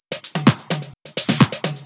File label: House loops 128BPM; Vengeance Samples